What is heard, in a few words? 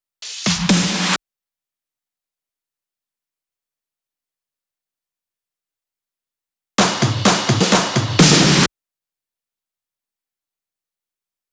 128BPM Fill Ins, Drums & Perc; Essential Clubsounds Vol.5; Break the Chains; Root G#min